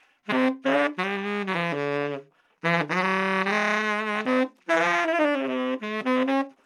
Tenor sax growl

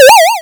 Toink 8bit

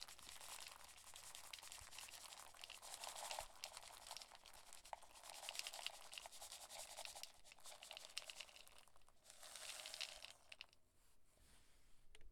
Pouring Food

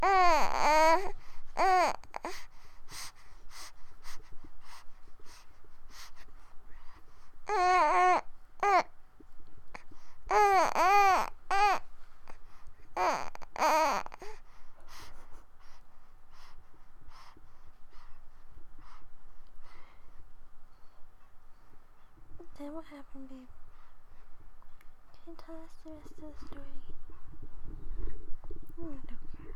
Baby Noises 3 Month Old

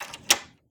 Door Lock Turn

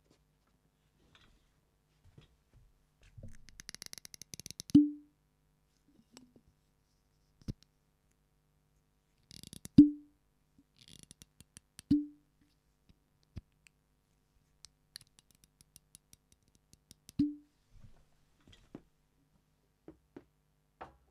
Wine Bottle Cork

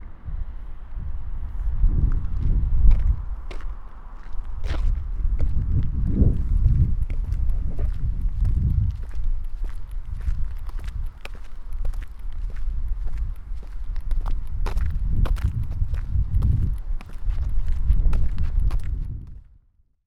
Walking Asphalt

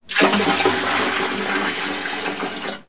Pouring Out Water Bucket

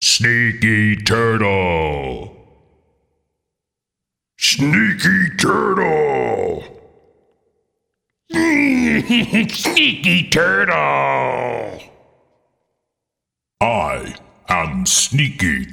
Sneaky Turtle

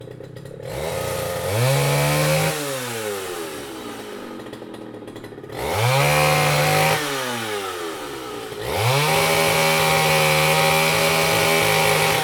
Tronconeuse Chainsaw

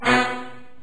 Horn Lo

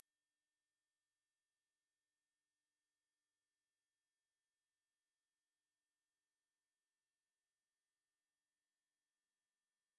17khz

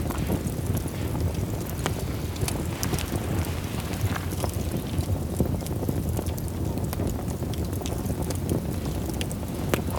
Fire burn Flame Burn

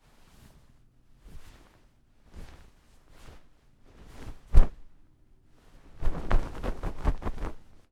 Comforter Cloth Pass

